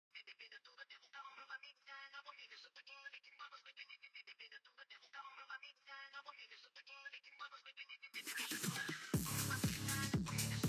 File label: Phonk